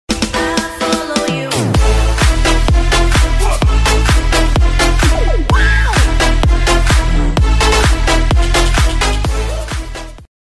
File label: follower sound; twitch; prod by niitrox